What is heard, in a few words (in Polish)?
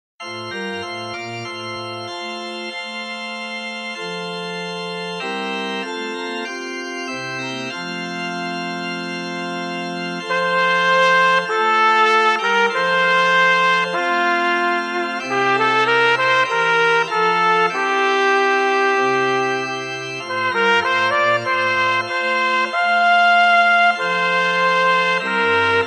chor mlodziencow 1 trabka